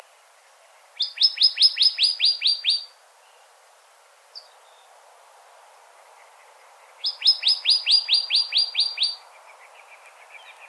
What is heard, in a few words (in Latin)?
Cardinalis sinuatus